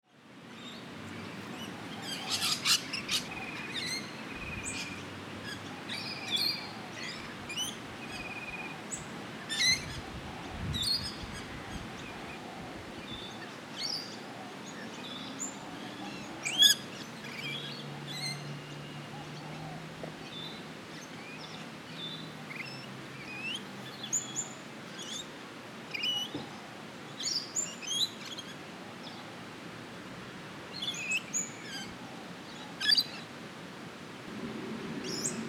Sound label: Suburban park ambience late afternoon breeze in trees and birds